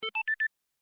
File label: ringtone